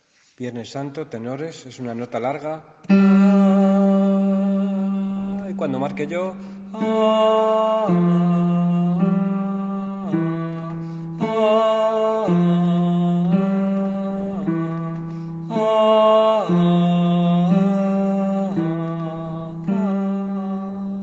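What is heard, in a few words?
tenor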